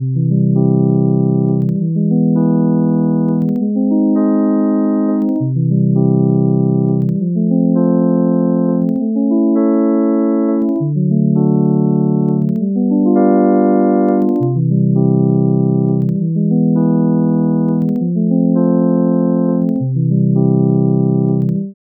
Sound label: recursive ji progression